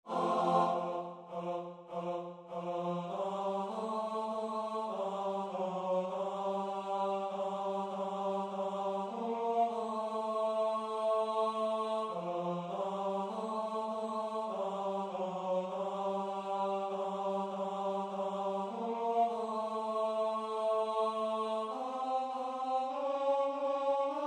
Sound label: tenor; korsats